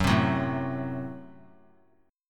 F sharp Diminished; F#dim strummed

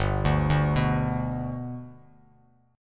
G Major G down Bass Standard; G arpeggiated; JGuitar

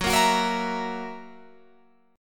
F sharp 7th, Guitar, Open F; strummed; F#7; 18,17,18,17,19,17

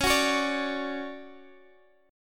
D Major 7th, chord

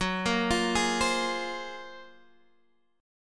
Gb Major Sharp 11th, Guitar Half Step Down; GbM#11 arpeggiated

